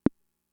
Conga, Fricke MFB-522

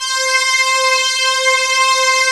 Prophet Five

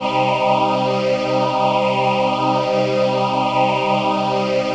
PHASE PAD

